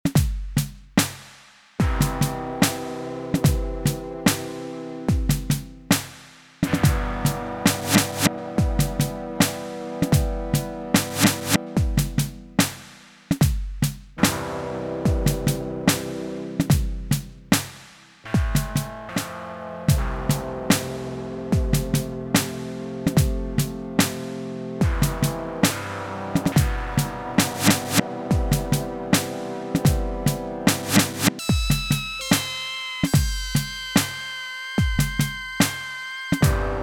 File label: Piano Jam